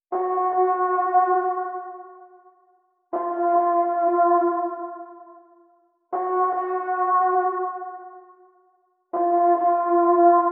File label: 80 BPM D# Min Ambience; Lofi; Melodies; Loop Stems; Cymatics; Lofi Starter Pack; Dreamscape